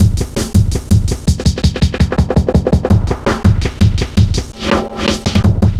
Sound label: Hard Edged, Drum Loops, 165.5